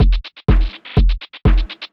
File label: house drum loop full - shaker present - e; tuned drums e key